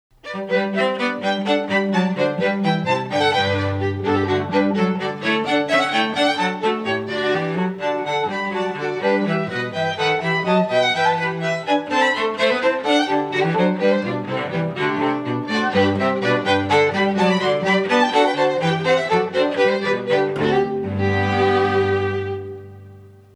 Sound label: bouree, strings